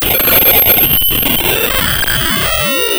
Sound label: Sound of a cat meowing